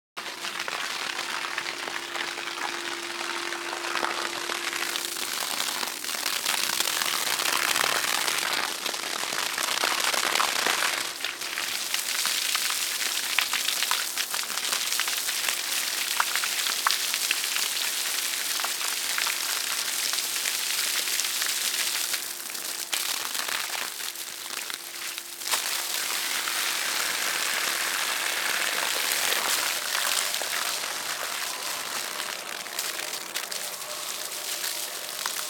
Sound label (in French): BRUIT Jet